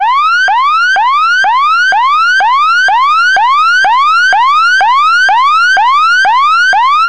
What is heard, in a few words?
police yelp siren